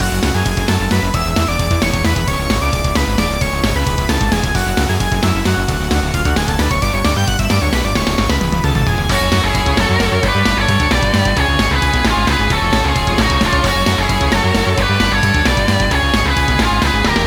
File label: Op Battle; android